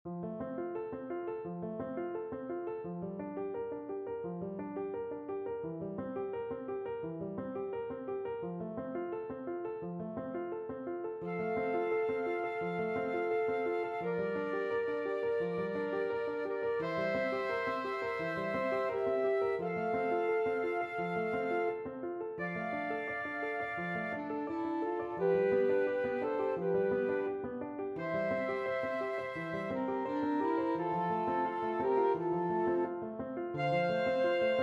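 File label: flute alto sax; gounod ave maria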